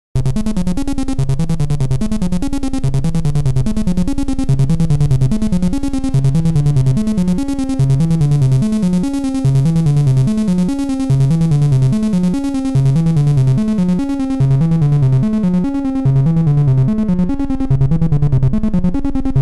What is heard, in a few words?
analog synthesizers sequencers; dr zee workshop